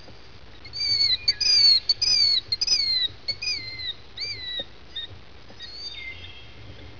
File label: killdeer